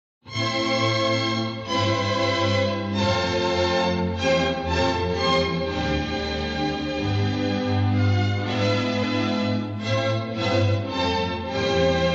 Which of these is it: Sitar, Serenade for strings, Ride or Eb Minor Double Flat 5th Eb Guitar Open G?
Serenade for strings